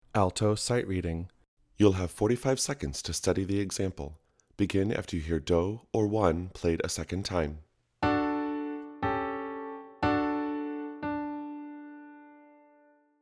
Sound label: Extended SR Alto